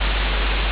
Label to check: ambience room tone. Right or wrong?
Right